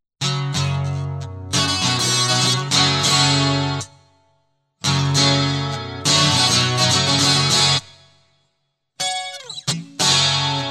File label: Guitar Loop